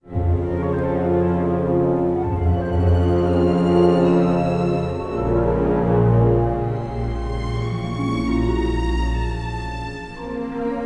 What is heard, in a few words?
intro, music flourish